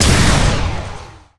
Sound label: new laser; Earth and Beyond; EA Games